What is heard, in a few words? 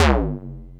hitsound